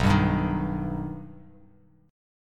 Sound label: B Augmented B Bass Standard, chord; strummed; JGuitar